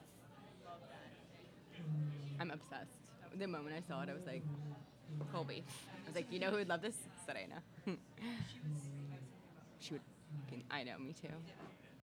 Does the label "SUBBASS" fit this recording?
No